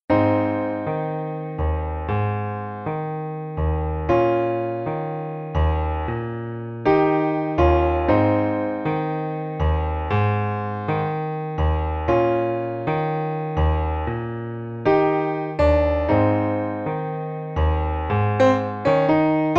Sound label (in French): Piano seul; La Paloma